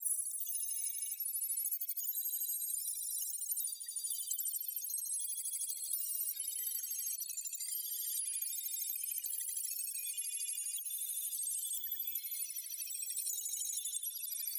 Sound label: botanica sparkles; fx; base pack